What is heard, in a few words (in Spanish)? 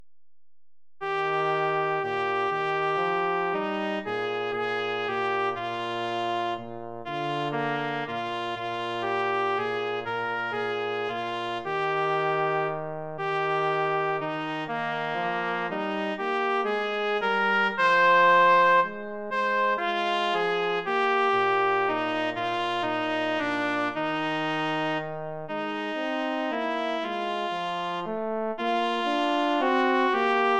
danza cortesana para trompeta bombardino